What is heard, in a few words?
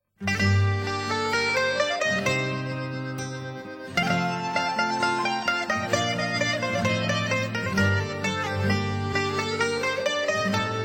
Junior Open Solo Zeibekiko; Junior: 12 – 15 yrs; Rizoelia Banquet Hall